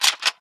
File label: pp19 switch; weapons